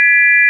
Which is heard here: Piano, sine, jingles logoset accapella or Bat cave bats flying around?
sine